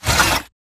Mvmt Servo Walk; AI Stalker; Titanfall 2

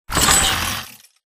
Mvmt Servo Walk; AI Zombie Stalker; Titanfall 2

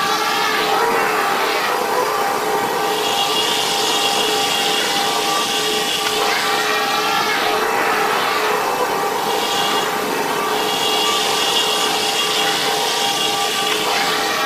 Giant Pillar Pods Active Hum; Titanfall 2; Boomtown

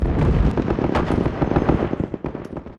scripted distant fog battle; Titanfall 2

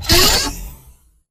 drone target locked; Titanfall 2

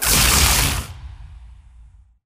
bullet energy titan arc; Titanfall 2

